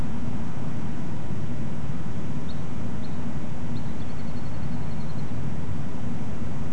Little Grebe pliks plus Whimbrel like call, nocturnal flight call; nocturnal flight calls (NFCs)